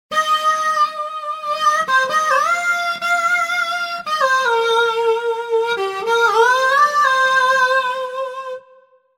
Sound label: Vox Lead; TURBO VL